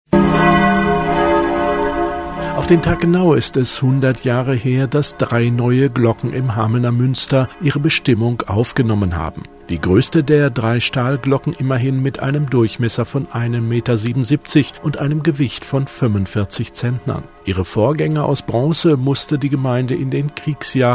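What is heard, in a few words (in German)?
glocken im münster; hameln; 100 jahre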